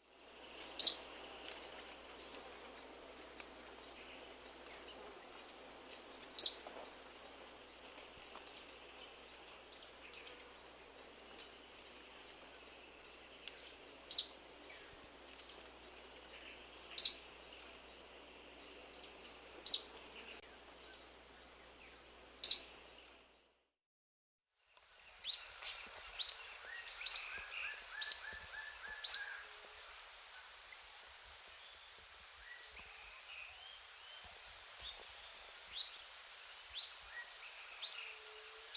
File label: Yellow-breasted Flycatcher (Tolmomyias flaviventris), Peru; S. America